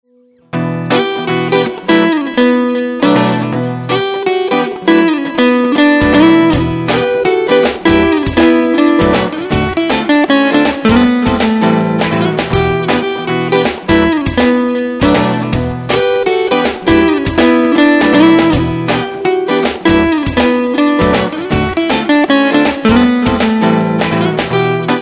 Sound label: okapia Hiphop, ringtones; Air